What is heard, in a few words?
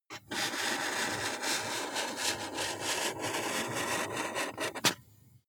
marker mark soft long